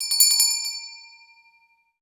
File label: General Store Door Bell